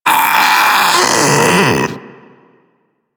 Infested Splitter Split Top Vocal Alerted; Warframe; Grey Strain

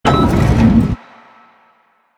Sliding Door Chime Open; Ambience; Warframe; NineteenNinetyNine

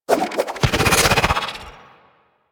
Dive Ability Cast; Warframe; Teshin

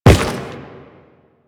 Step Rock, Footsteps; Warframe; The New War; Kahl; Quests

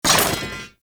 Animal Rescue Cage Locked; Encounters

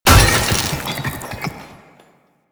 Loot Crate Medium; Warframe; Entrati

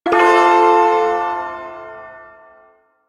Shawzin Maj Chord; G; Warframe; Duviri Ersatz Shawzin

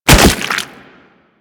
Death Fall, Bodyfalls; Warframe; Infested; Crawlers

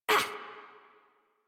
Pain Adult; Warframe; New War; Adult Operator

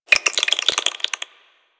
Infested Minion Attack, Vocals; Warframe

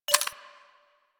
Pistol Mech C; Bard

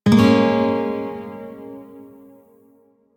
Shawzin Phrygian Chord L, Music; Warframe

